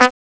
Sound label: TUBA; CMI